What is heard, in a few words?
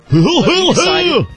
funny laugh